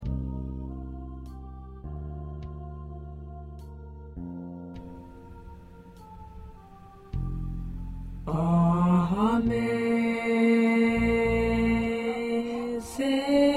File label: 1st Tenor